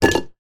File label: food apple slice drop on plate